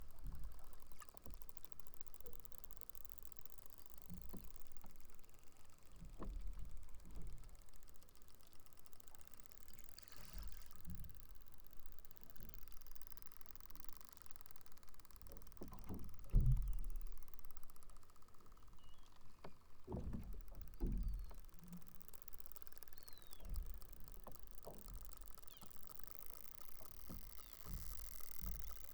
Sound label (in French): Globicephales